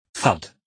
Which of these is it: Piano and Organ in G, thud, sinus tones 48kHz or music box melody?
thud